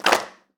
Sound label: hand clap d sharp key; percussion; single hits one shots